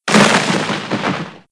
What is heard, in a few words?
Thunder Rumble; Playable Tutorial Level